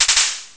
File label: gun